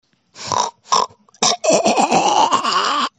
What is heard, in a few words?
ahhhhhh; meme